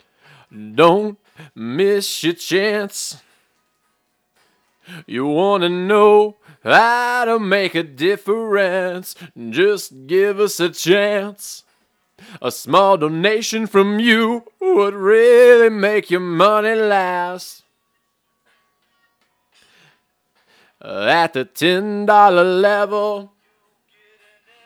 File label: vocals